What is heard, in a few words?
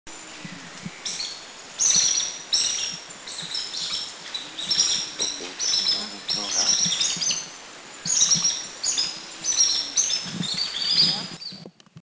Rufous Coucal; Philippines